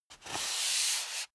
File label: step barefoot wood parquet slide long; schritte; Footsteps